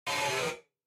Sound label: bad noise; Funkin